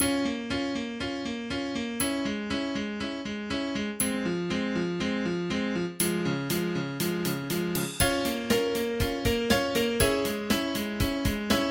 Square Song

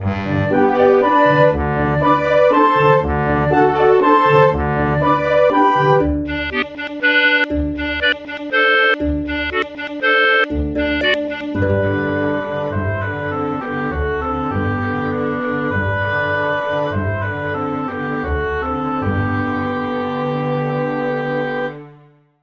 Happy Instumental

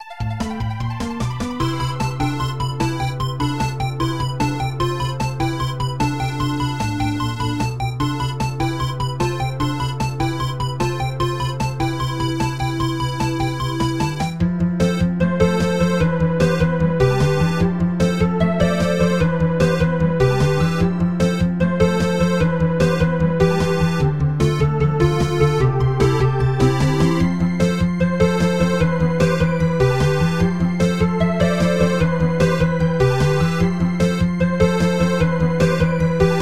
Bass Remix

